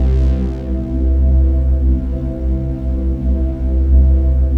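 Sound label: chord pad, Pads; Best Service; XX Large - Pads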